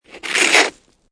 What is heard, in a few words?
Snow And Ice Ice Scrape Slide; Sound Effects:Weather